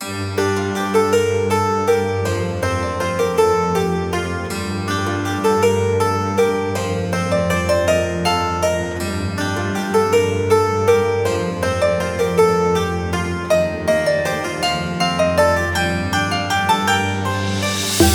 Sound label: medieval ambient; AvvA: Echoes of Creation; Sandbox MMORPG Fantasy